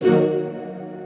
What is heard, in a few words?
Stab Strings Phased; One Shots; Ableton Live 12 Lite; Core Library